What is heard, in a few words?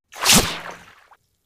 Bullets Slice Water